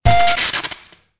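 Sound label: elevator beep; Soldier Of Fortune II Double Helix; XBOX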